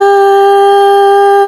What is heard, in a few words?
drag, Voice